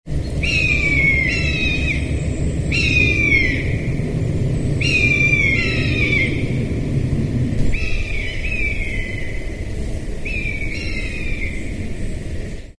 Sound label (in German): bussard